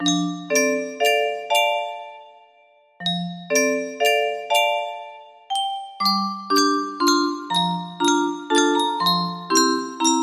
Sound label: F scale music box melody; ff14